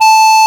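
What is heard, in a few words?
FMA clarinet; AKAI S6000 CD-ROM - Volume 1; BrassWind; WIND&HORN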